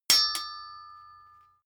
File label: sword metal hit ring long